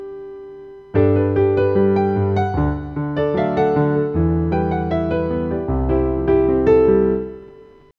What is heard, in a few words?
Piano